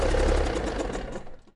stop, vehicles; ram

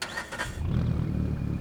startup, vehicles; evo vi

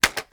reload fast end, weapons; gmod; viper

